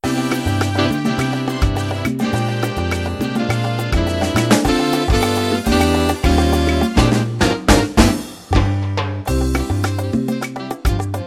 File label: Big Band Mambo, rhythms; mzx500; preview pack 01